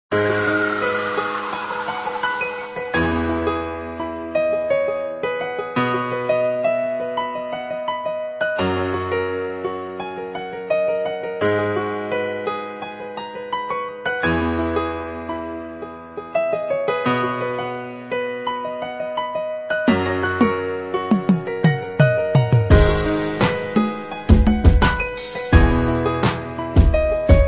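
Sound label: piano r&b instrumental love beat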